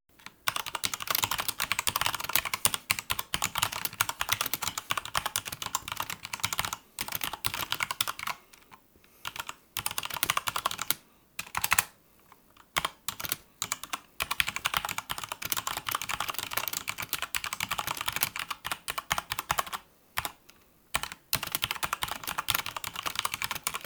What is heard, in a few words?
Asus Rog Strix Scope